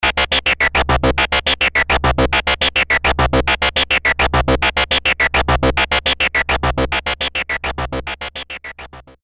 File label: Edgy Synth; Apple GarageBand 2; Apple Loops